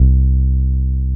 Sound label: TB-303